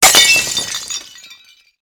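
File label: sound effect of glass breaking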